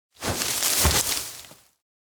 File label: pop out hedge; anemoi